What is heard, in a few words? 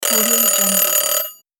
alarm clock